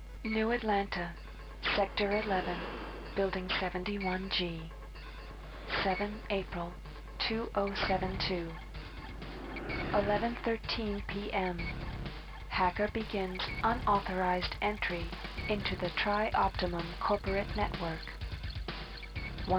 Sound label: SBP ESS1868